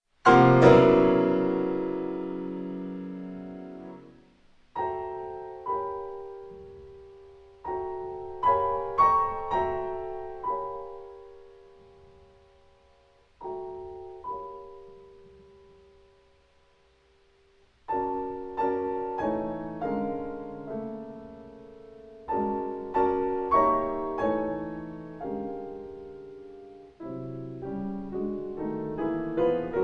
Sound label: piano; Trois Préludes pour piano